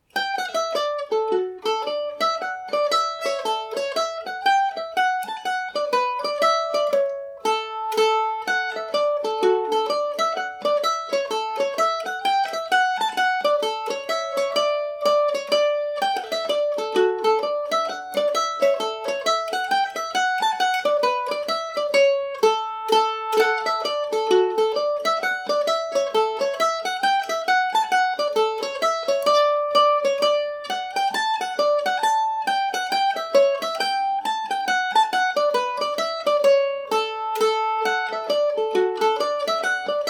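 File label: D major, irish mandolin; G&O #34 mandolin (sticking fairly closely to “the script”)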